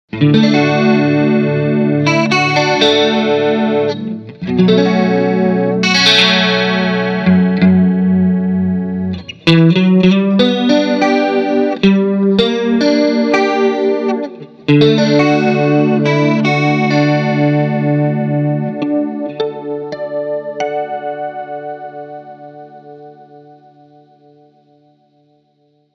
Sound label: clean chords